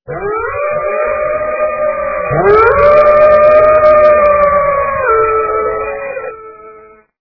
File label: lots of wolves howling